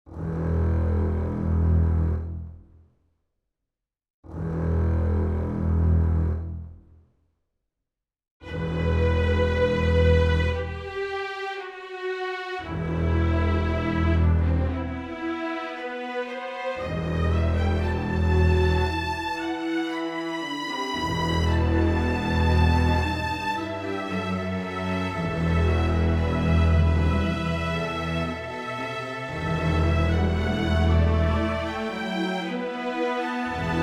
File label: Horror in E-minor